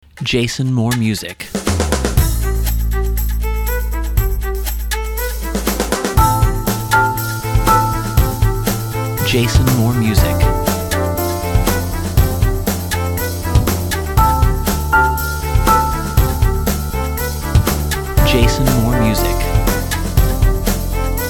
disco acoustico

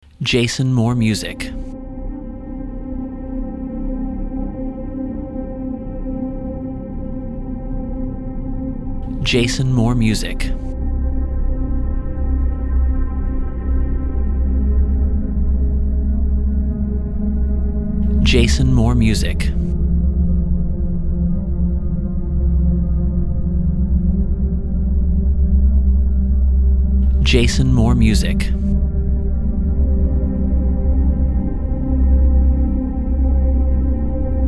Droney Dronealicious

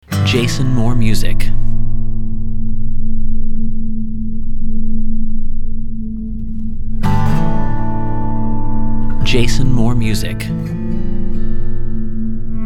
Ominous Country

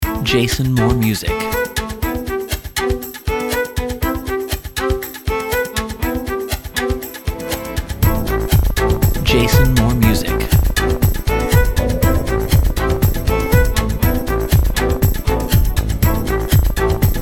disco electro

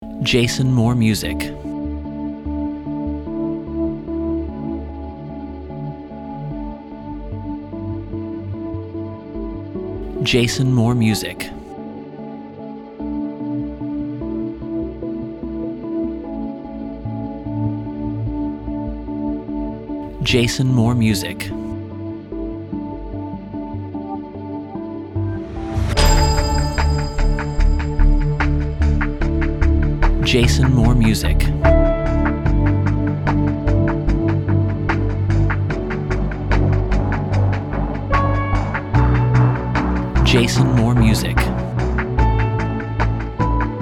Ambient State

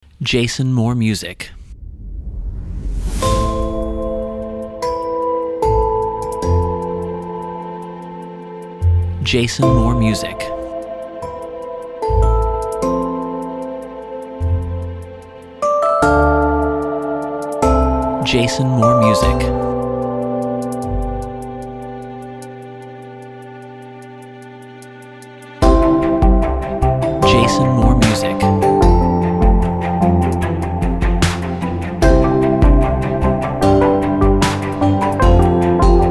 Synths For Days

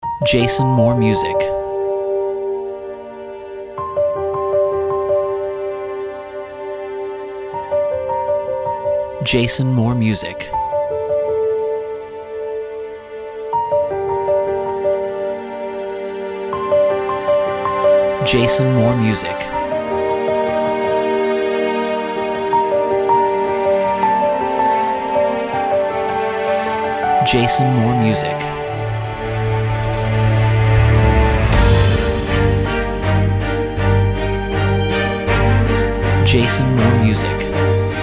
cinematic buildup

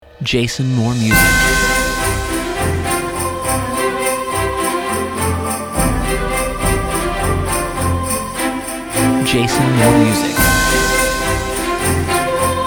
JINGLE

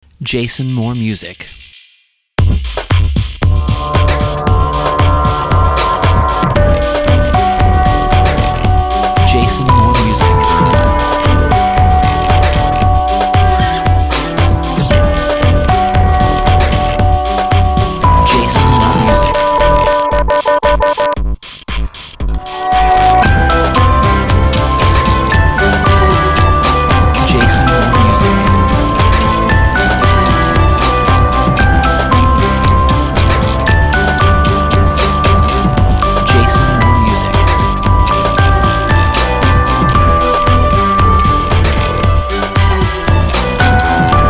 Classical Tronica

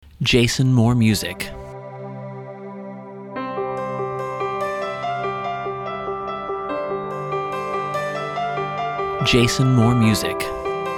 Spring Anthem

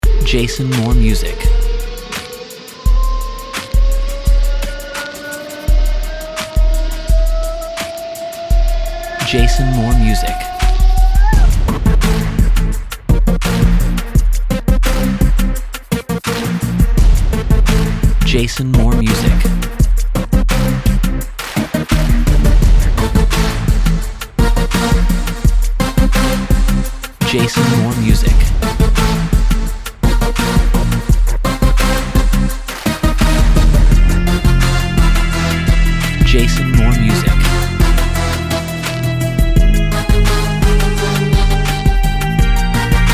Hip Hop

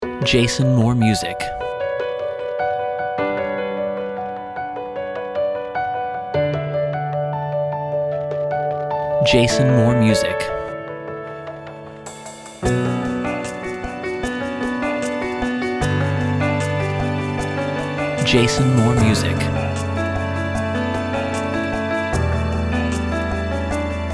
Fall Rain

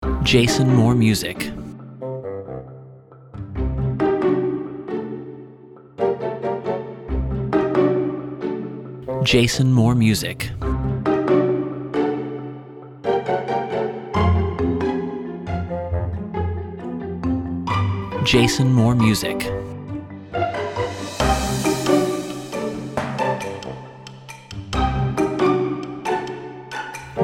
Romantic Sneakery

